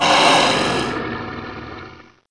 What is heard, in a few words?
death; mystic radar; mr zombie1; gonome